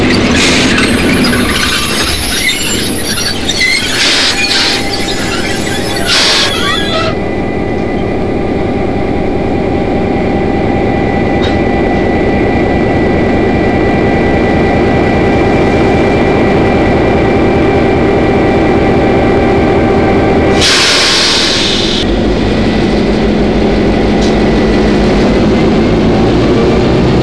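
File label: yardwork, train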